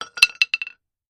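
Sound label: Hammer Dropping Metal; hammers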